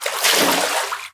water wade, footsteps

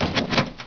latch locked, buttons; Source Engine; dod

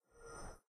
windows minimize; operating systems

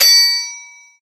potato timer; source engine; portal2; labs

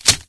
tmp clip in, weapons; Source Engine; cstrike

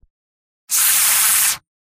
jeers; spy; video games; source engine; tf; mvm

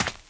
dirt, footsteps run; player; source engine; left 4 dead 2; survivor

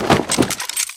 zipline hit ground; npc; source engine; episodic; combine soldier

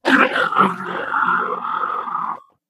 pain wall crash; player

